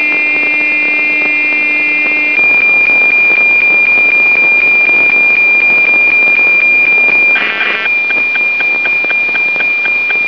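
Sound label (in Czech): Meteosat kratky, short; Emgola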